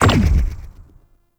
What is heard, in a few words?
Noctua Shot Punch